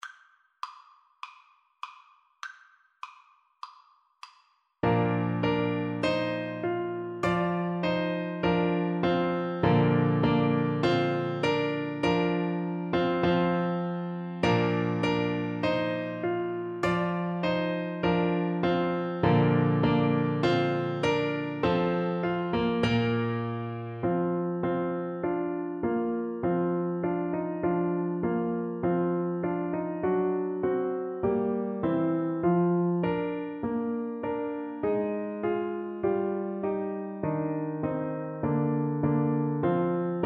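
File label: beethoven ode to joy, alto saxophone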